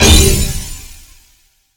Mystical Hit

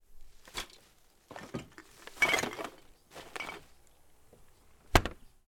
Throwing Trash Bag Into Outside Dustbin

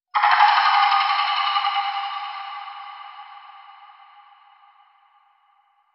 Spring Rattle

